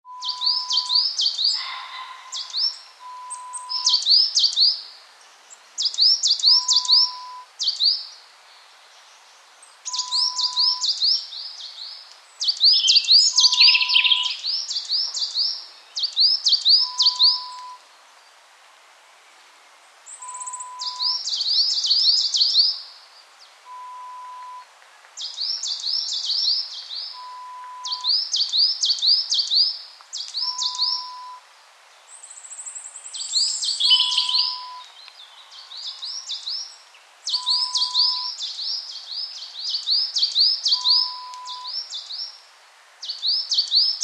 woodland sound

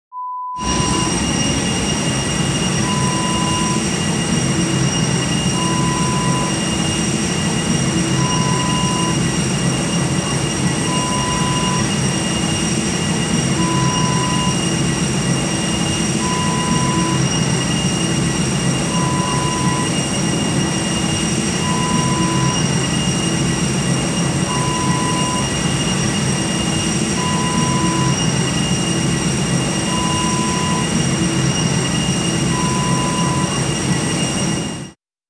Airport Ramp sound

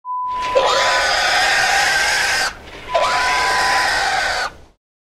Squealing Pig sound

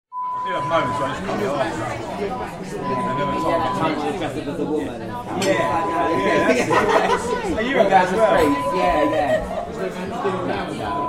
Italian Restaurant sounds

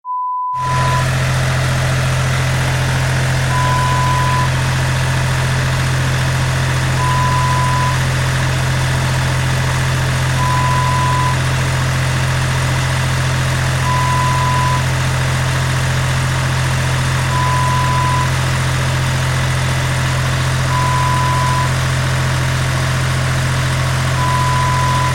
Diesel Engine sound

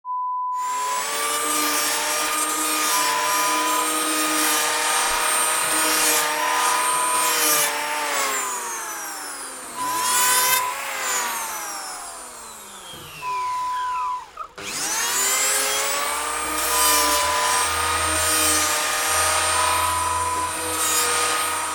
Power Tool sounds ELECTRIC PLANER

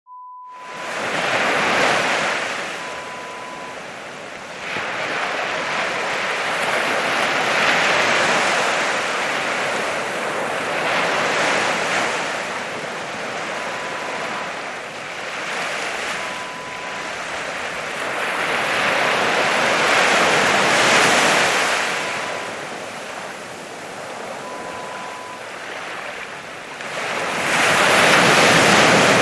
Ocean

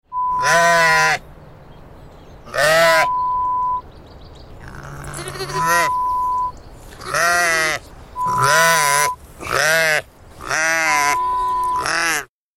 Lamb sound

